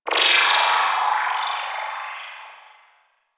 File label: Ship Data Chirps; Ambience; Warframe; Corpus Ship Remaster